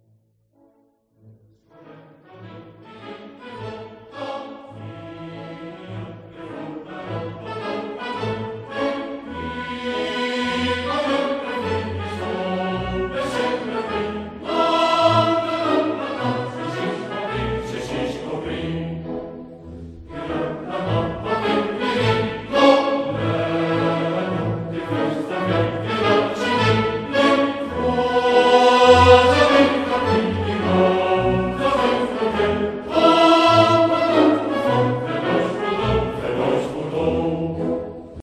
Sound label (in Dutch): Hovelingenkoor; Rigoletto – G. Verdi